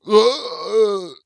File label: guard die; propack